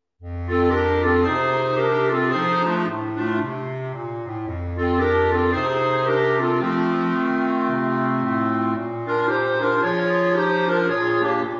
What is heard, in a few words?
Blues